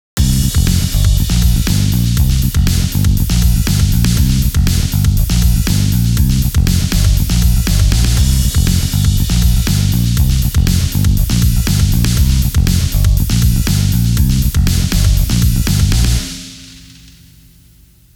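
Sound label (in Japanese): グルーヴ B, shuffle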